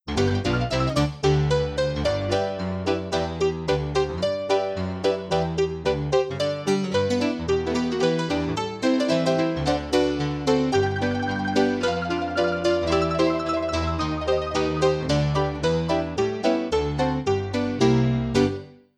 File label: when the saints go marching in ringtone